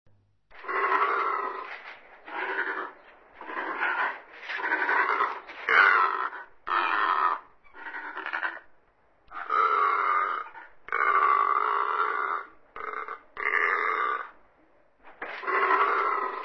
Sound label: Long-Legged Land Eagle